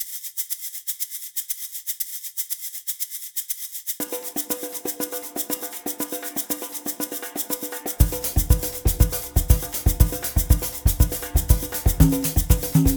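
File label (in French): Une souris verte play-back sans claps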